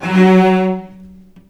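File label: F#2 arco long cello, right; East West; The Ultimate String Collection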